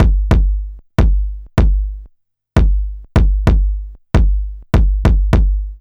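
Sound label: NAKD bass drum, drum loop; Big Fish Audio; Uncivilised G'rooves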